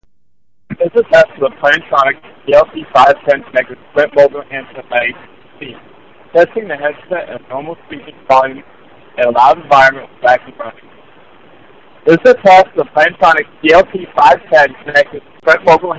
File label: Vacuum; Plantronics PLT510; Mogul